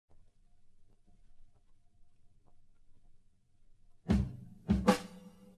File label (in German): gefiltert